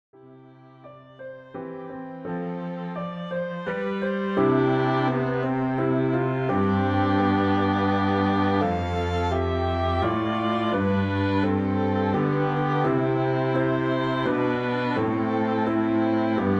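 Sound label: low accompaniment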